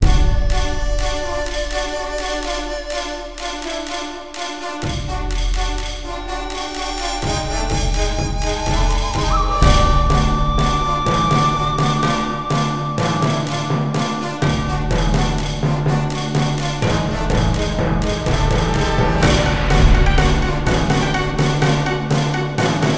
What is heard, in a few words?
Asian Intense; Xiaolin Showdown; PS2